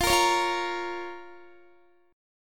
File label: F Suspended 4th Sharp 5th F, Guitar; Fsus4#5; strummed; x,20,20,18,17,17